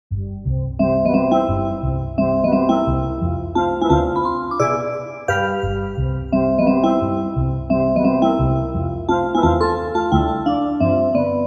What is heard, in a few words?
RagTime, piano roll ragtime